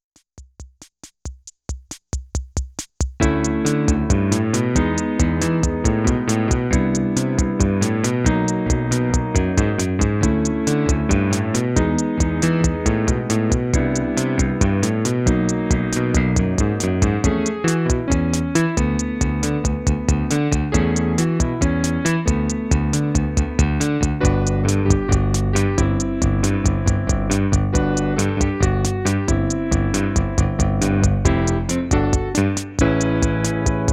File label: drunk piano; weeklybeats 2024